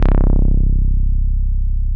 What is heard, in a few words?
MOOG1 BASS C1